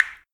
water drop; DeadFright

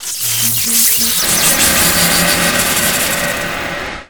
Elec Energy Spark Engage; Energy & Forcefields

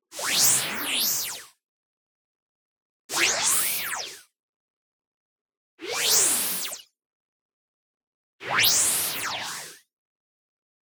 MOVEMENT FAST Two Filters; boom library; Anime Essentials; DSGNMisc

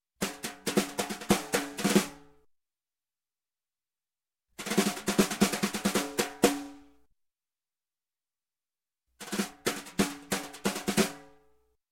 Snare Drum snare Off, accent With Brushes, Music; Sound Ideas; Series 2000

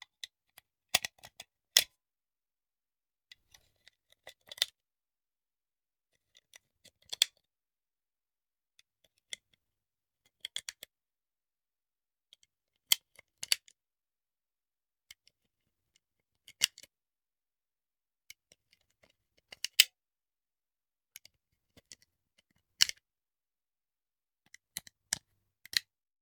Hand Gun Foley; Sound Ideas; Guns

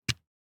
DICE on Felt, Drop, Standard, 4 Four Dice; Samples; ArticulatedSounds; DICE Sfx Party (Extended)

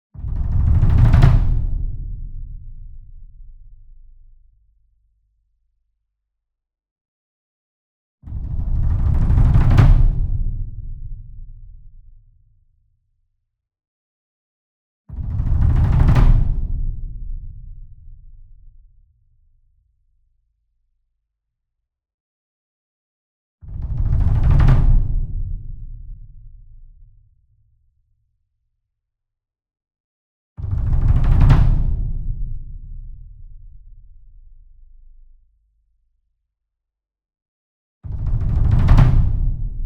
SINGLE DRUM Verdi 66 Inch Roll Hard Beater Near; boom library; Cinematic Strikes Construction Kit

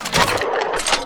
Gun Reload Rocket Launcher, Weapons Foley; SFX Kit

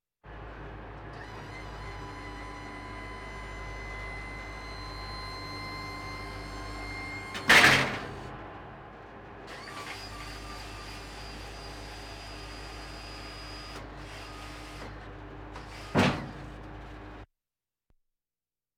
Military vehicles

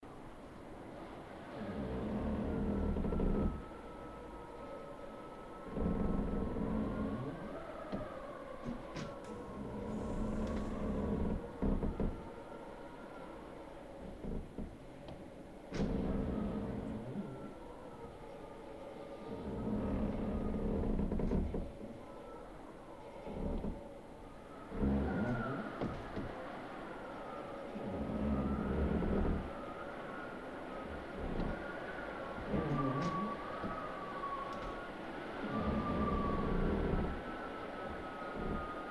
Lonely Manor entrance Hall

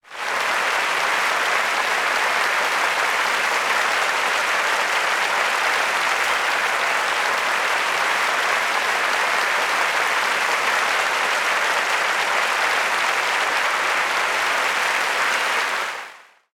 applause large; best service Blue Box

